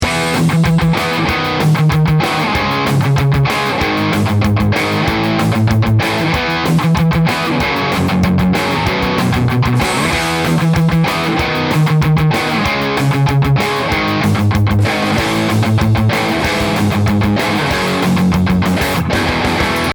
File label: GTR; waves